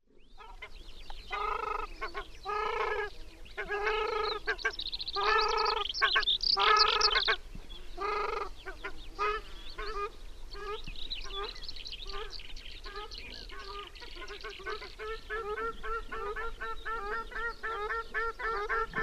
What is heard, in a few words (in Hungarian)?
voros asolud Tadorna ferruginea